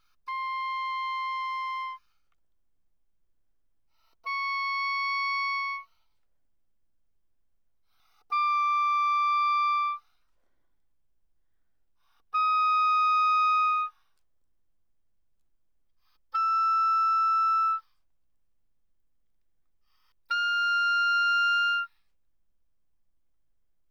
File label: Oboe mf C6 Ab6, woodwinds